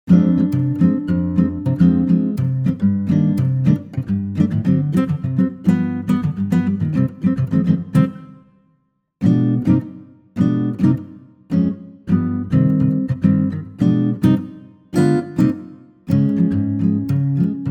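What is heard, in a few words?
acoustic Guitar Cm Dbm Dm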